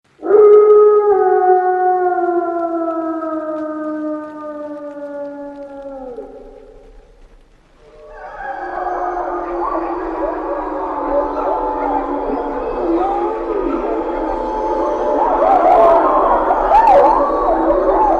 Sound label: gray wolves howling; Animals